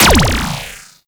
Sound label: Mindhack Beam Loop Start; Warframe; Infested Lich; Abilities; Zeke